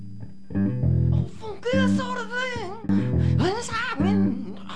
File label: funky